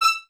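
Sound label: Violins E 6 staccato right; Miroslav Vitous; String Ensembles; 11 Violins; 11 VS Stacc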